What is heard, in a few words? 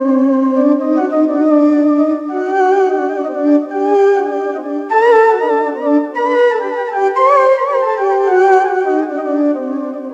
SYNTH, ARABIC